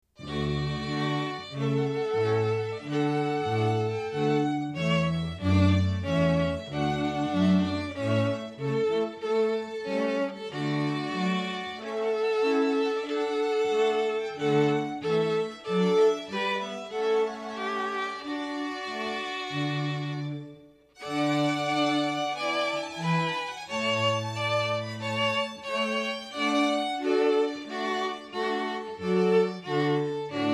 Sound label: Trumpet Voluntary